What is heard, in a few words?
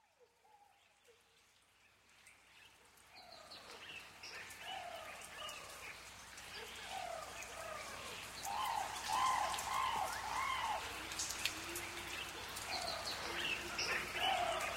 Jungle, Rain Forest; The Sounds Of Nature